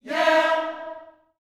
"yeah", gospel vocal, E4; Spectrasonics; Vocal Planet Vol.1 Gospel; akai

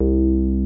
TB303

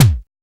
BD; E-Lab; X Static Goldmine 1

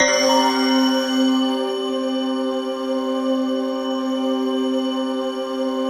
ATMOPAD; Best Service; XX Large; Pads

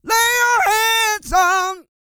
SING

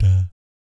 "DAT" F#2, Vocal Jazz; Spectrasonics; Vocal Planet Vol.3 Jazz Fx

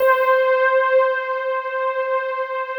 PAD, Pads; Best Service; XX Large

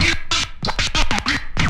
SCRAT, VNL FX; E-Lab; X Static Goldmine 2; akai

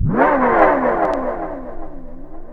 SYN FX; E-Lab; X Static Goldmine 2; akai

